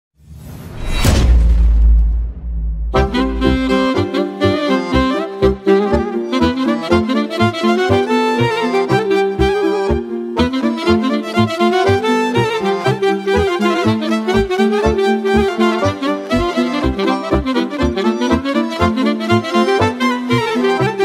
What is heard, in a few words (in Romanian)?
Program De Folclor Muzica Petrecere